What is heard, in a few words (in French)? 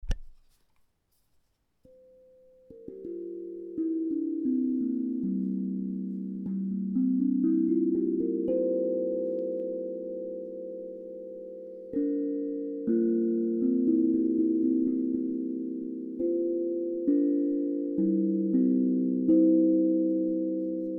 sol 432 hz